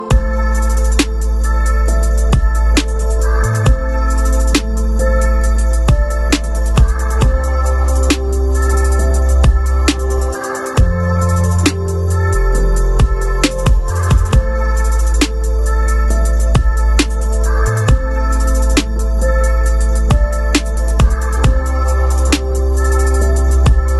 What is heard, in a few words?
emotional ringtone; english ringtones